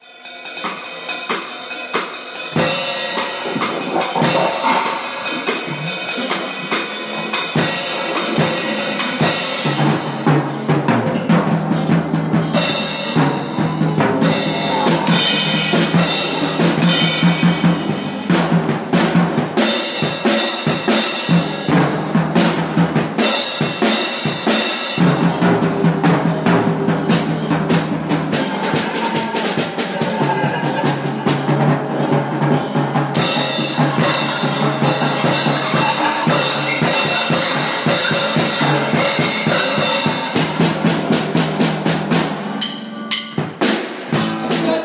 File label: Drum Solo